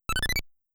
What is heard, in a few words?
Get Item; mother1